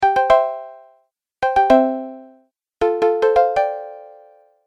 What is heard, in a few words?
Message Alert Sound Pack, musical